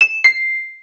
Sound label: Carrier Boot; Samsung SGH-i607 BlackJack